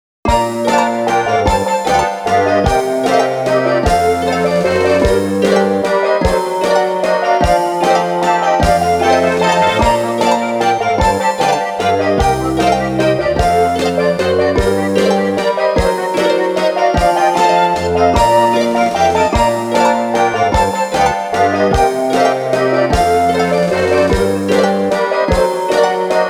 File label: Warm Waltz